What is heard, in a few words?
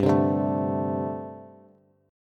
G Suspended 2nd Suspended 4th, Bass; Gsus2sus4 strummed; JGuitar